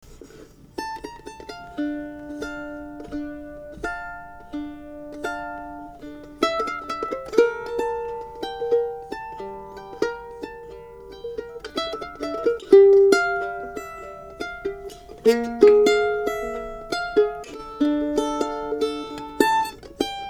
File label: Mandolin